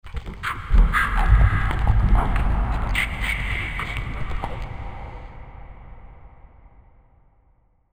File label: eating thing